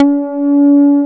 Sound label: SYN Ana; Best Service Gigapack 1